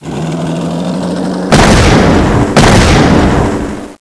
cavalry; MAMMOTH

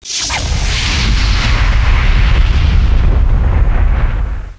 JET CRASH; WW1

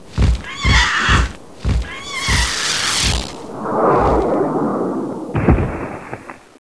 JETSPUTR; DINOSAUR

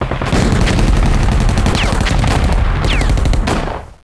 INFANTRY; WW1

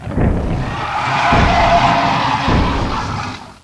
SWORD FGT; DINOSAUR

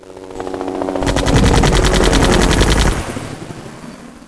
HELI SHOT; PARADISE

